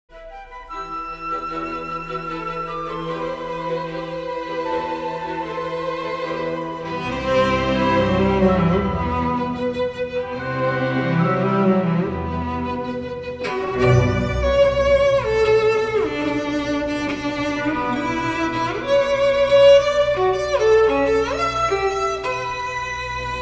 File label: FIRST MOVEMENT